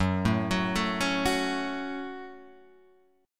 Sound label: F sharp Minor F sharp, Guitar Open G